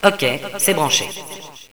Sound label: kit; Villagina; Village; ECOLE